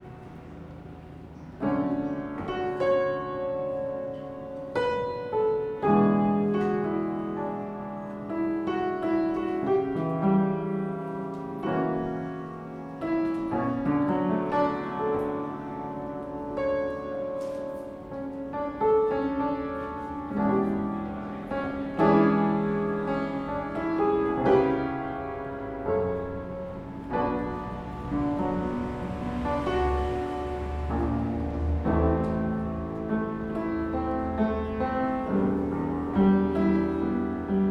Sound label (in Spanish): Loops mutantes piano cama